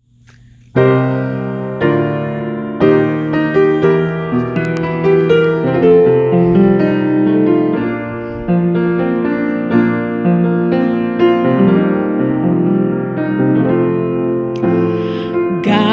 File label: Tenor